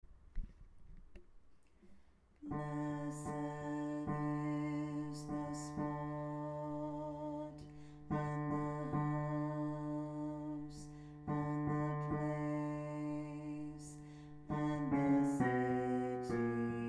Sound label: bass voice piano